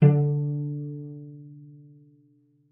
cello pizz D3; 90 s Sample CDs; Xsample VOL-5

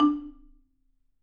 D3, Marimba; Sampleheads; Dave Samuels Marimba & Vibes

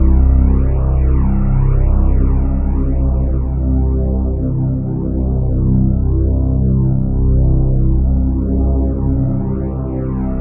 ALIENDRONE L, PADS FX

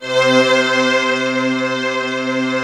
STR, YAMAHA, Analog; Analog Fantasies; USB Soundscan vol.49; AKAI

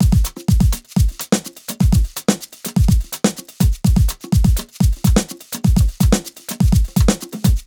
125 Broken, Nu Jazz House Beats

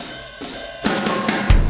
Impact Crater Beat, Processed Drumkits

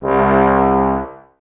Brass, Woodwind Brass Ensemble

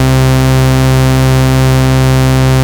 MC 202, BASSES

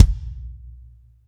BD, Bassdrums, Roland TD-20

